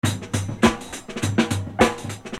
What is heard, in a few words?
Beat, Hip-Hop Street Beats